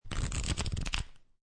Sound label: Playing Cards Fan Flip; Casinos; Lynne Music